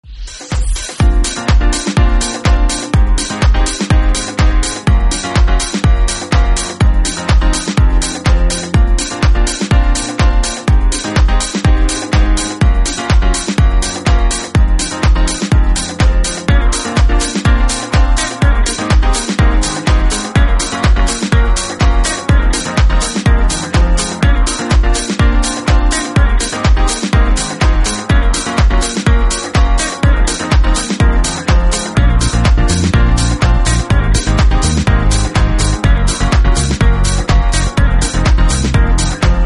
House Instrumental

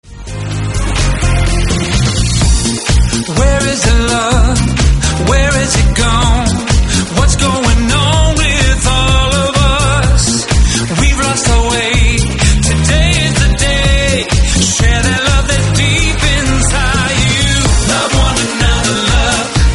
Jackin House Radio

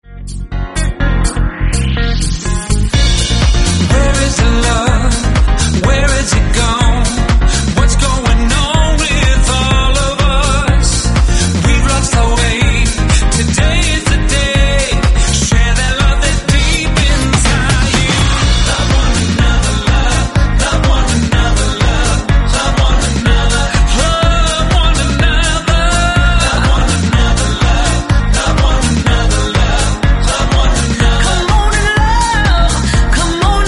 House Radio

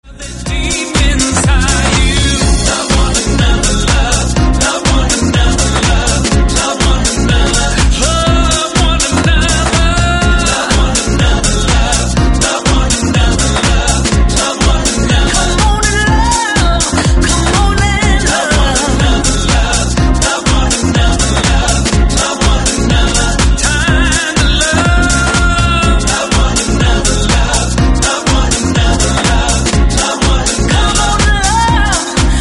Soulful House Radio

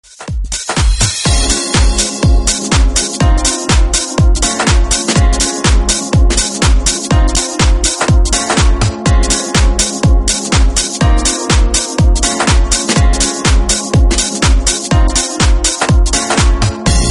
Soulful House Mix

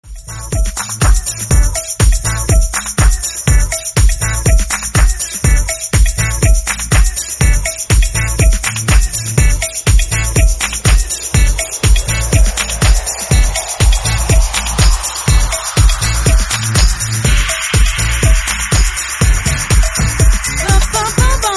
Raw House Mix

